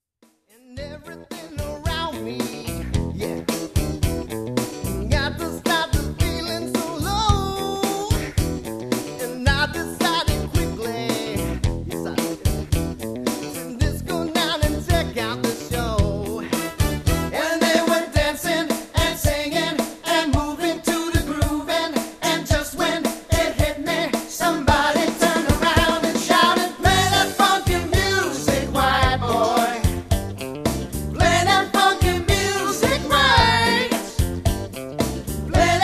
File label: funky music